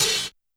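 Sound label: Hi-Hat Open Break; YAMAHA RM1X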